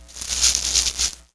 leaves, plant

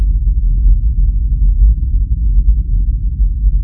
Engine Class4, ambient

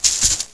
palm, plant

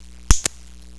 snap, plant

